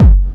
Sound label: BD, Dance Mega Drums; 90 s Sample CDs; Best Service